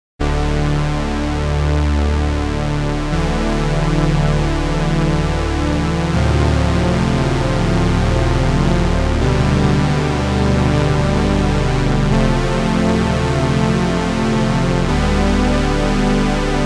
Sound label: fat strings; obx; vst audio; opxvst